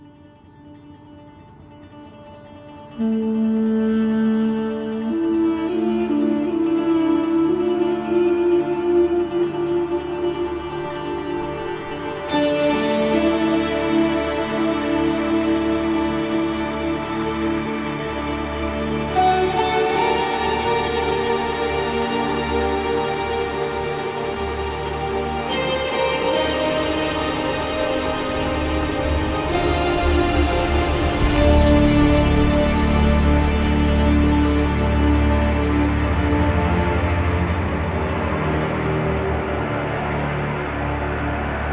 Outro Cinematic Cue; Entrati; TennoCon 2023; Cinematics

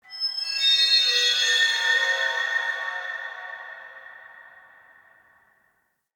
Root Cave Magical Chimes; Ambience; Neathuns Root Cave

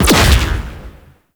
Space Fighter Proj Explosion; Warframe; RailJack; Corpus; Space Fighter Gox Agent

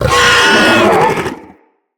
Infested Juggernaut Vocal Attack; Vocals

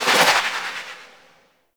Bullet Jump; Fairy

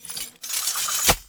Idle Sword Twirl

